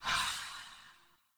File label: Generic Footstep; Warframe; Choir; Foley